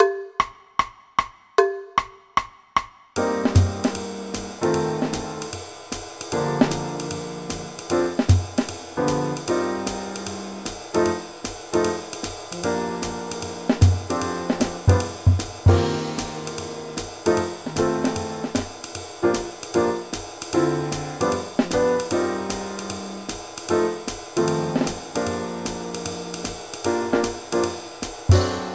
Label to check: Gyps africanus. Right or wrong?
Wrong